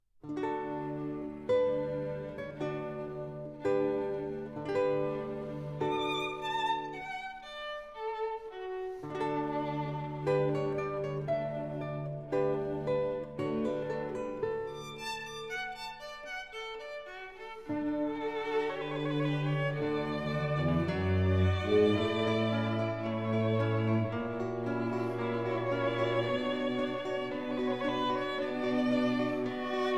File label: Quintet D major Fandango Grave assai; Quintet No4